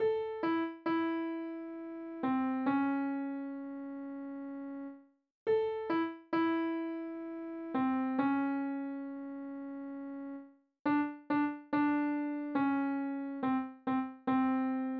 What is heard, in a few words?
alto